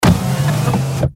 Sci Fi Robot Machine Servo Motors; Sci Fi Robot Machine Movement Servo Motors Science Fiction; Blastwave

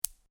Marble Impact With One Marble; A marble or small pebble impact; Miscellaneous Toy Sound Effects; LynneMusic

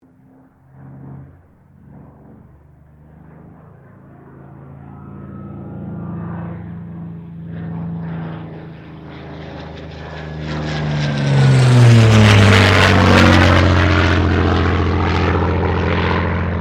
AIRCRAFT SUPERMARINE SPITFIRE MK-XVI LOOP; Airplanes (Sound Effects:Vehicles)

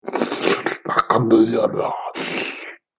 Alien Monster Voice; Alien Sound Effects

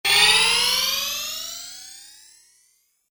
Generic Bonus Or Pickup, video game

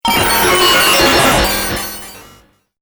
Droid Data Motion, robot; Robot Sound Effects

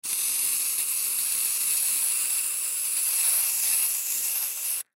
Carpet Cleaner Spray Long; Cleaning; Household

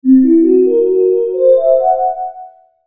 Logo Soft Synth Ascend; Melody Arpeggio; Blastwave; Sonic Logos

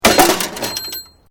Metal Light Movement Minor

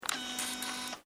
Camera Digital Canon IXY 60 Switch Off

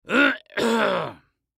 Human Cough; Clear Throat Loud Male Old; Clearing Throat; Blastwave

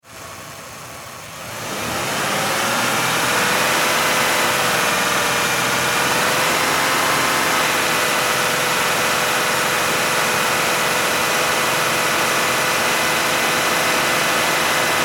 Car Mercury Sable Medium Rpm; Power Car; Car 2002 Mercury Sable Medium Rpm; Car Sound Effects; LYN110 package of Power Car sounds

